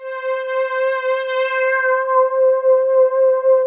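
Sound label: synth strings C4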